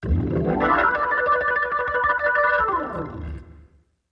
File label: Organ Trill